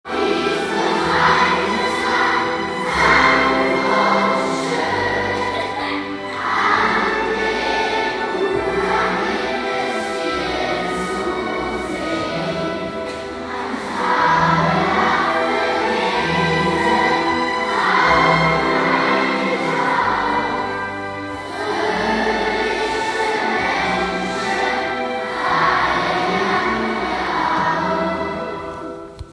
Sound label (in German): Chor, Grundschulkinder; Die Moldau